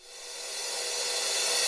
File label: Cymbals Reverse Crash; Vengeance Samples